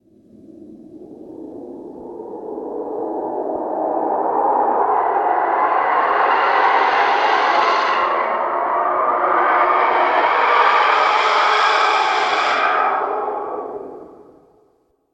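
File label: sound of whirlwind, nature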